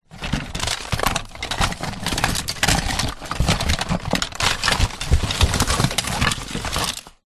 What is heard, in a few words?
leisure toys rummage box